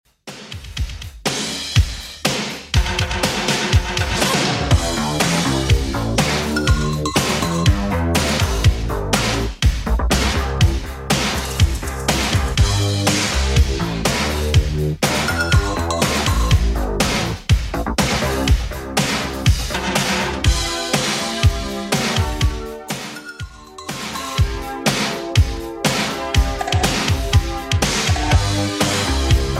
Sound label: 80s Redrum 122bpm